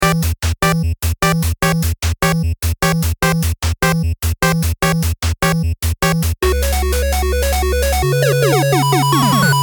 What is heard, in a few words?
Short 8Bit